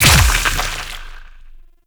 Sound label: Impact Large; Duviri; Incarnon Adapters; Weapons